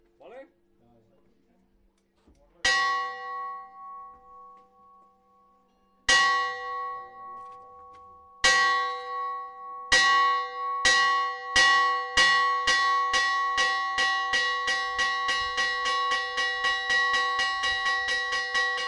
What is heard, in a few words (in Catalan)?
campana